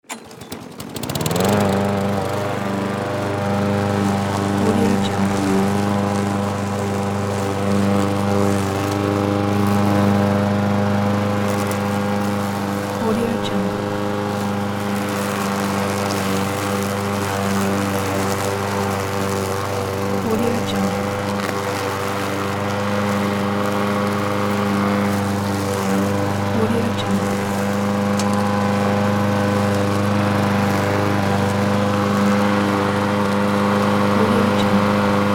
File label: lawn mower; lake3sound